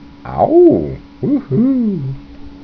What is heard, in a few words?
whoohoo